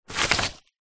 foley paper sheets construction sugar set down on surface; zapsplat